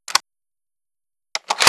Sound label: oicw reload, weapons